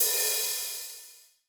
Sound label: brush; Northstar - New Gold VOL-2; 30S BRUSH